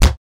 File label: Cabinet Door Slam, Close, Interior